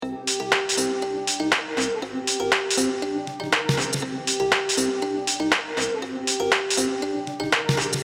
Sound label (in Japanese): センド リバーブ